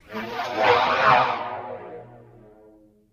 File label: Ghost By Low Pitch